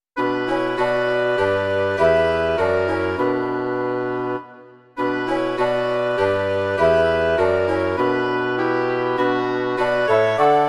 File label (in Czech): dechový kvartet; Před mírným zrakem Krista; Imaginativní verze